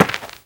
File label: foot dirt, footsteps